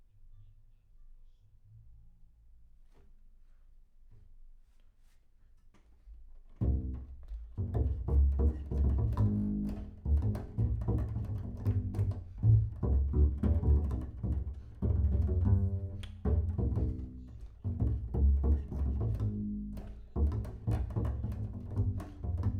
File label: Bass Room